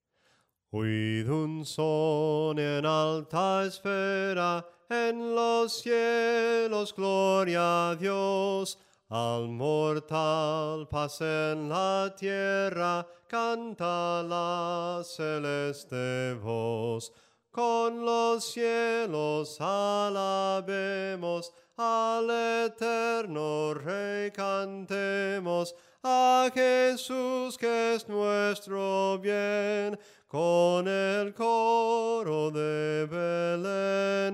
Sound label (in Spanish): SOPRANO CANTADO, himnos en melodia soprano; OID UN SON EN ALTA ESFERA; cantos del camino